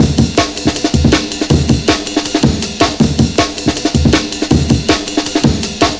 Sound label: 160, Drum Loops; Total Drum&Bass